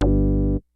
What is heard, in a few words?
HI TECH BASS; Kid Nepro; Elite